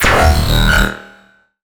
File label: D bass